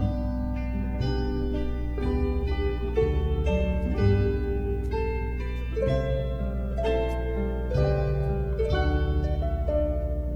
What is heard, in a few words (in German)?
orgel